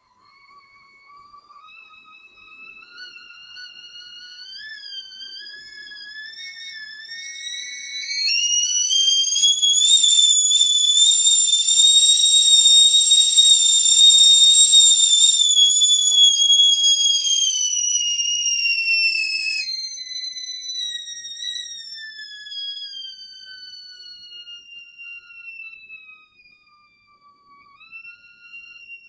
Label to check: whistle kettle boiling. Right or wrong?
Right